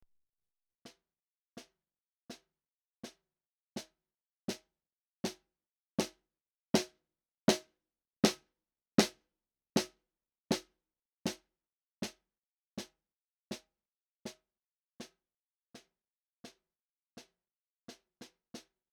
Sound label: Snare 2.5in from Bottom